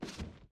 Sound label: motioncomic next